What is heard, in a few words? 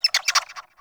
Bird Clicky; Ambience; Warframe; Eidolon Plains